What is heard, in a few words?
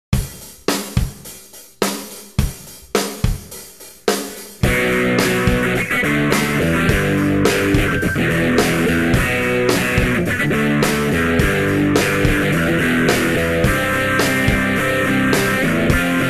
Rhythm Guitar